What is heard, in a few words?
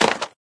wood stone; Novetus